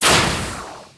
miss, crossbow

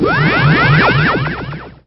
fire, weapons; crossbow

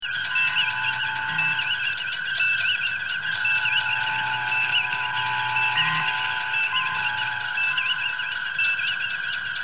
bridge ambience, voyager

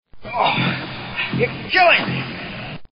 pain; Kirk

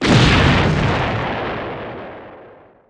explo large

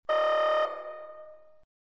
red alert

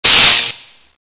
pain; hunter

